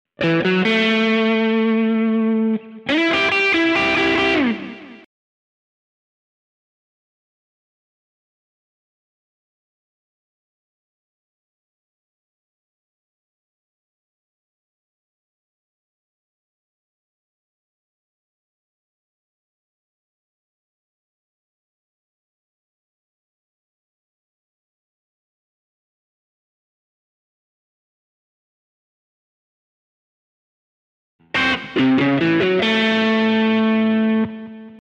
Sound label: Country Rock in A 135 BPM